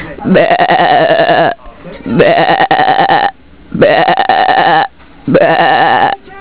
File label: Sheep Sounds